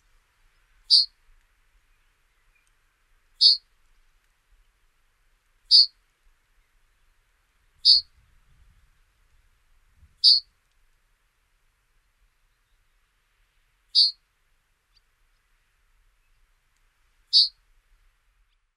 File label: yellowhammer